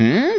humm; BattleSNDS

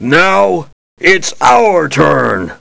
LAUGH; BattleSNDS

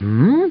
humm; BattleSNDS

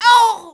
hit; BattleSNDS

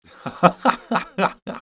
LAUGH; BattleSNDS

